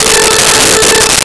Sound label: Pipe Organ A5; Nintendo; July 2020 Gigaleak